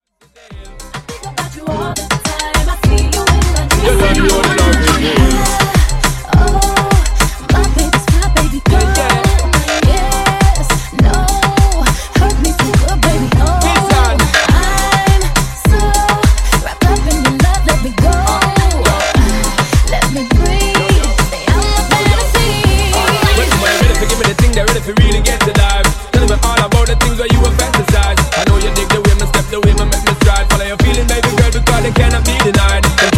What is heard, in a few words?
Funk Transition 103 130Bpm